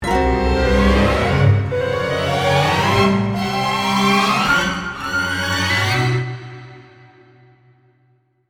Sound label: Magically Rising